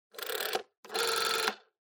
Rotary Phone Hardware Dial

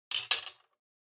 Bingo Machine Drop

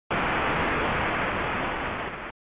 NES Fighting Cheer

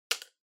Drawing Box Ticket Put

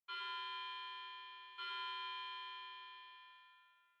Wall Clock Far Time Signal

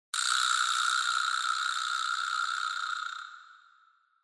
Vibraslap High Long Reverb

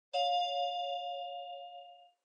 News Alert Far

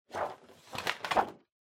Calendar Flip

